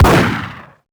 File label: shoot beretta; weapons; Max Payne